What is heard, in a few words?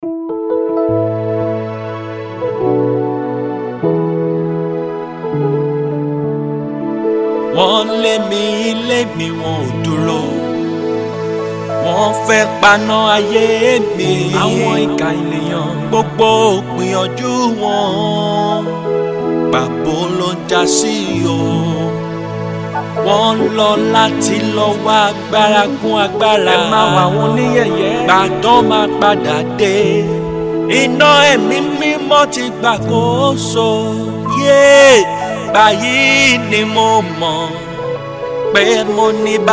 Godly voice